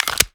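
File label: pistol reload empty fast mags large mag in; gmod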